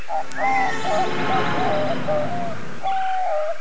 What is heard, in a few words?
bird